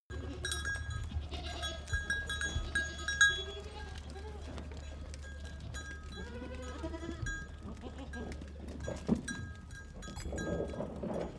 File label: goat herd move